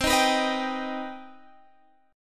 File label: C Minor Major 7th C Guitar Standard; strummed; JGuitar